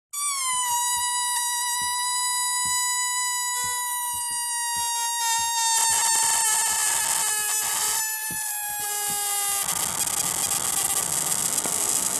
balloon air release deflate from valve hiss squeak; foley